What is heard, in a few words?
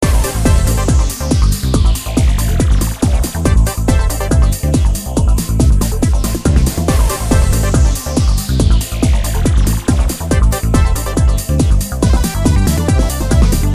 loop